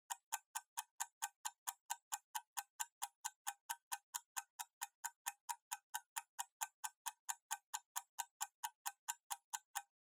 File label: Kitchen Timer (Tick-Mid)